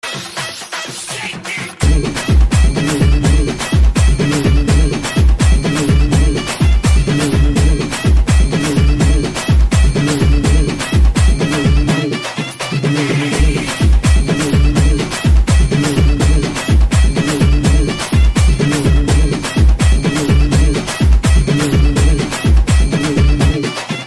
Tamilan

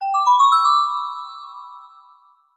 Cool Tone

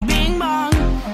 Bing Bong

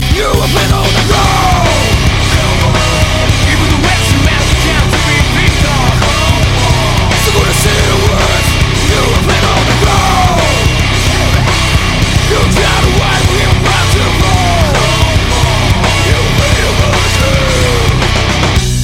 Distorted Daytime

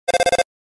phone call; undertale

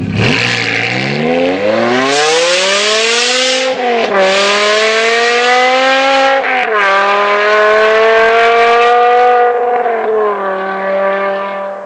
Bmw M5; Meisterschaft

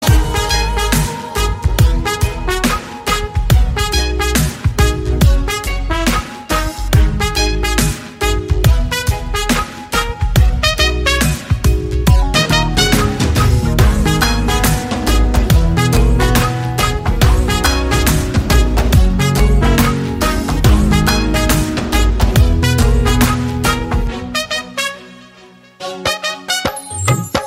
Trumpet Dance

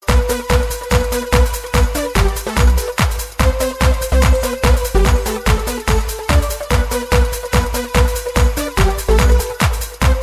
X-mas Tone

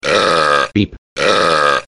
Burp Beep

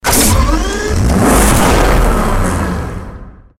Bentley Supersport

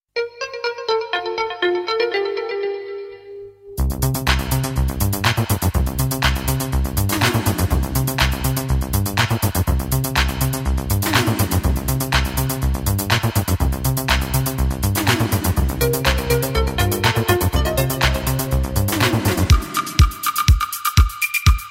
koto

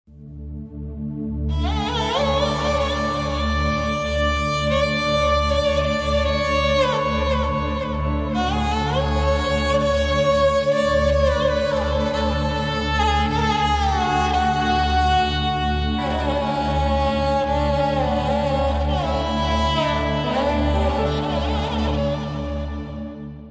Violin Beauty

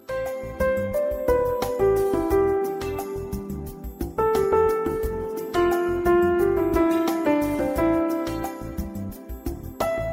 Piano

